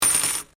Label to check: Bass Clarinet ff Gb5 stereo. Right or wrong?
Wrong